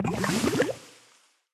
Spider bite; Hero Asra; Poison

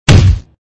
Low Impact; Extra Lives

